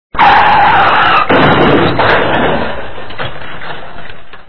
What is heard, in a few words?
CAR